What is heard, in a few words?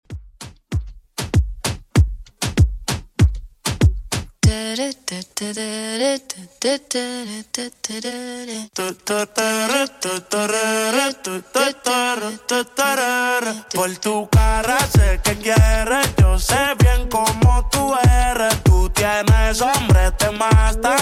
Top40 Rton Redrum 97bpm